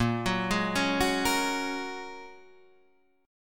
A sharp Minor 7th Sharp 5th A sharp, Guitar Drop D